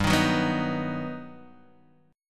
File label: G Augmented Major 7th, Guitar, Half Step Down